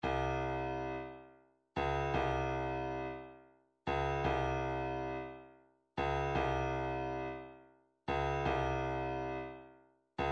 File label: kick fx, midi